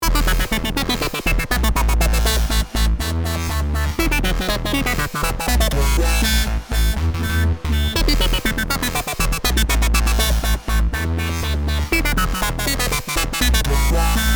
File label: Nokia bass